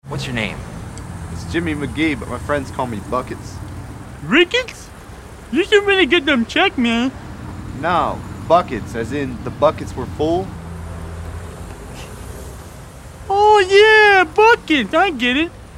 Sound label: traffic fix